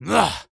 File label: Humans Male Grunt Shout; Screams Shouts 2; soundbits